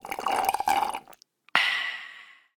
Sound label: drink soda straw